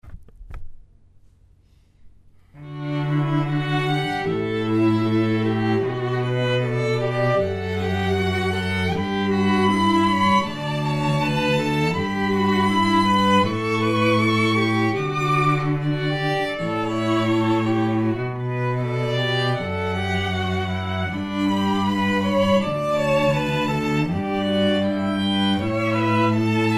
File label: String Trio; Violin, Viola & Cello; Ceremony Musicians